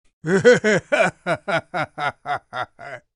risada; singed

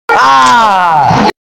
pessoa sendo chicotada

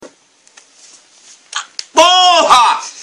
tirando a farpa do dedo

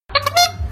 buzina

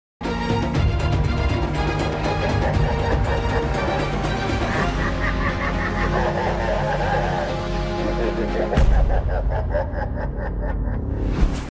risada monstro

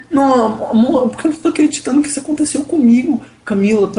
choro

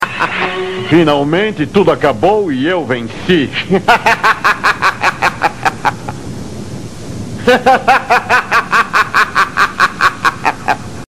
risada; saga de gemeos

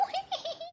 risada estranha

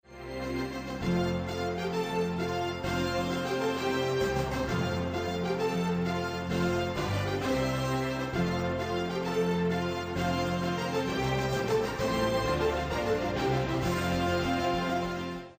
hino tradicional fifa

